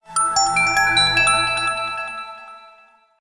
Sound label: complete